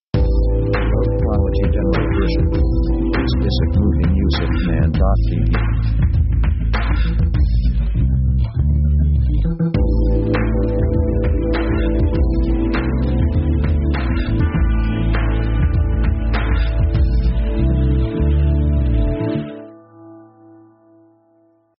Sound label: pop hip hop meditation